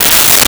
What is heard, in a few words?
Raspberry, Comedy Vocals; Vintage Cartoon; SONY PICTURES SOUND EFFECTS SERIES